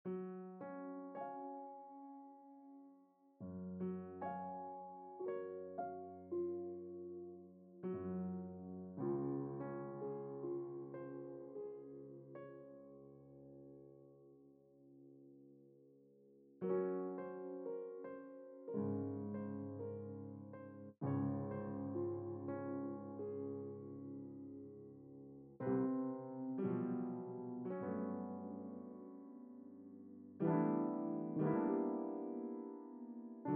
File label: type beat